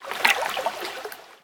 lake; Level2 Ice Fields

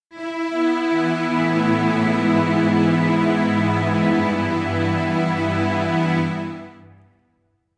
strings; Undead Overlord

